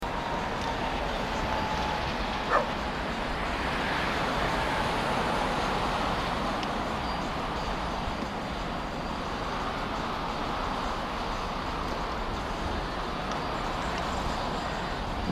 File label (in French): son d ambiance exterieur station